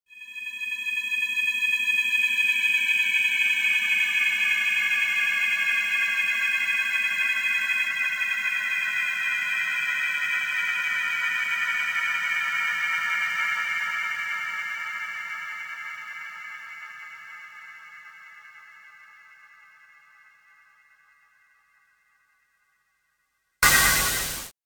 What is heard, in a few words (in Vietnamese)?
Âm thanh căng thẳng và hồi hộp